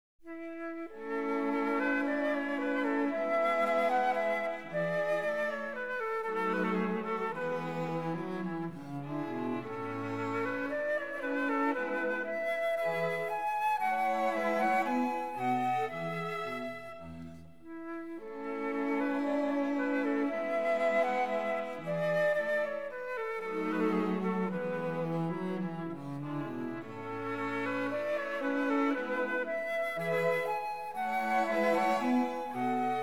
Quartet for flute, violin, viola & cello in A major, Andantino; Theme; Mozart; The Flute Quartets